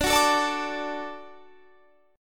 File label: D Major D down Guitar Standard; D strummed; JGuitar